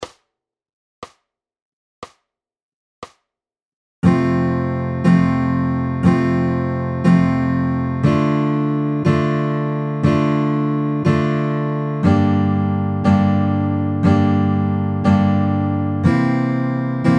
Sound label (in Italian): Giro di Fa maggiore Fmaj